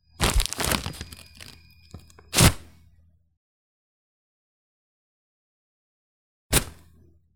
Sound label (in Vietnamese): rút khăn giấy ra khỏi hộp báo